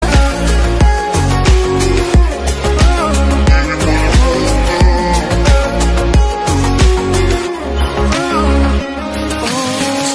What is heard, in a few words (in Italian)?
cellulari samsung